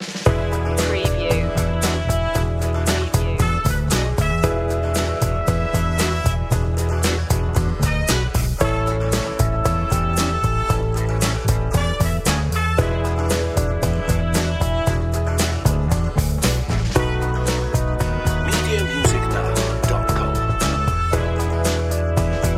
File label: funky groove